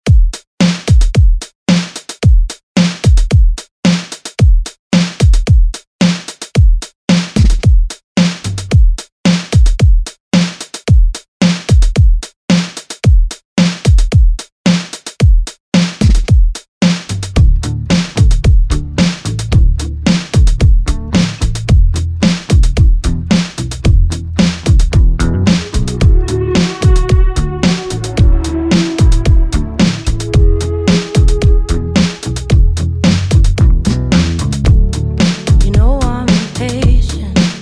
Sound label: 2k 111bpm